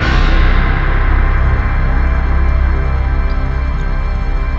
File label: EII PPG STAB, EMU; Rubber Chicken Software Sample Bank